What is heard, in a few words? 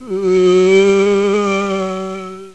Zombie Idle; cstrike